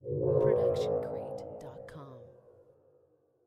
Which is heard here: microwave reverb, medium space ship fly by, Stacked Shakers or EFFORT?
medium space ship fly by